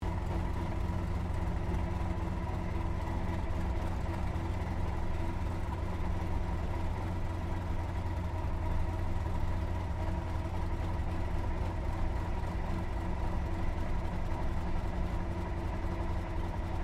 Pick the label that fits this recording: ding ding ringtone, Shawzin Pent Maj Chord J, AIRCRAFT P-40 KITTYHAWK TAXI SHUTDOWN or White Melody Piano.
AIRCRAFT P-40 KITTYHAWK TAXI SHUTDOWN